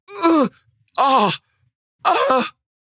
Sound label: crawl